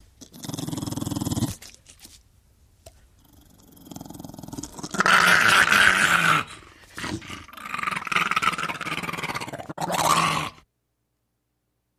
Pit Bull Gargle Multi

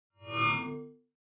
Mouse Over HF Elect, mouse over

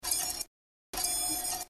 Fast Whir Access Disk, computers

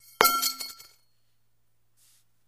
Pipe Drop Bounce

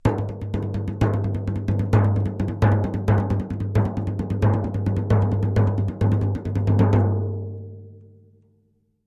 Drums Toms B Strt Id Si

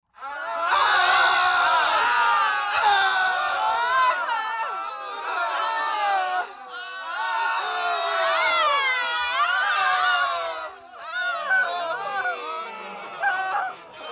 Grp Wailing Painful M

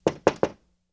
Knocks On Glass Light

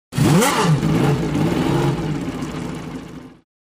Ferrari Start Idles, sports car

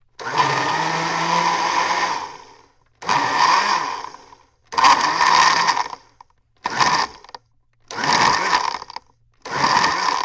Blender Ice Cream

